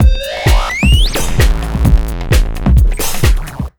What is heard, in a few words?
JUMP 130, Big Beat Drumloops